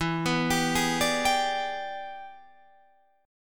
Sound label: E 7th Sharp 9th Guitar Open D, chord